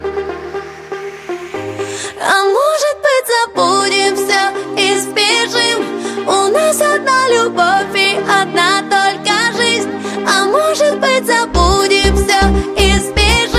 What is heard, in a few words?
Russian music